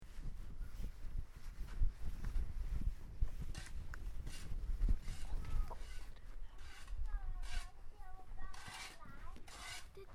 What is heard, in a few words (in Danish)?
klint sneskrabning